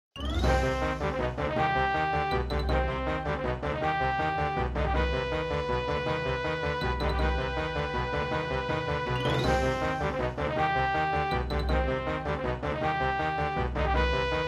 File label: MIDI Battle; Secret of Magia